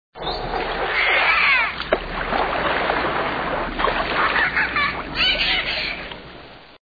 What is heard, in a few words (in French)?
Dauphin